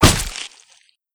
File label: hit; hotline miami